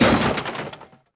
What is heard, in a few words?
hit wood; impacts explos